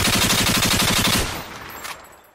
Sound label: machine gun gunshot